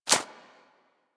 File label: Bird Of Prey Step Dirt; Warframe; Footsteps